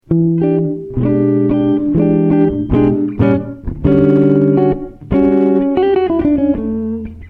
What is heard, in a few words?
jazz